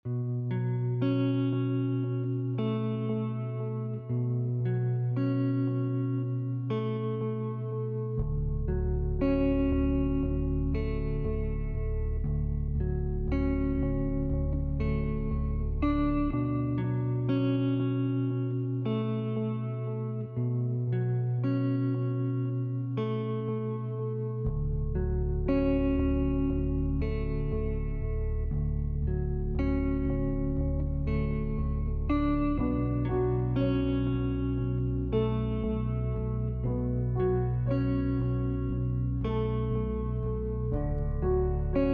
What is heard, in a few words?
metro type ost